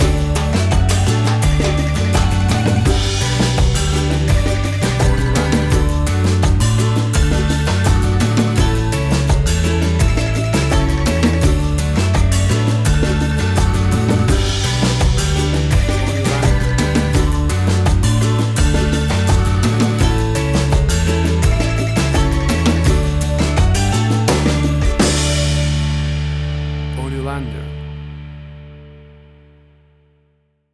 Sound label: Calypso Summer Ballad